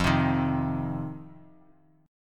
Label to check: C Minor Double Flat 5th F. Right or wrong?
Right